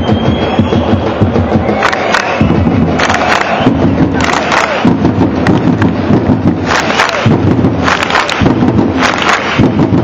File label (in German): Klatschen; Stadion; Gesänge; Stellt Euch vor, Ihr steht mal wieder im Stadion; Eintracht Frankfurt